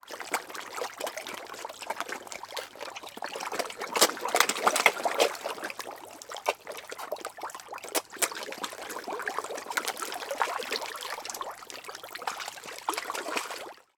animals fish large fresh water many eat at surface; sound effects one